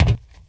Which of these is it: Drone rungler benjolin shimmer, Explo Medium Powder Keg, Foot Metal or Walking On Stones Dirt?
Foot Metal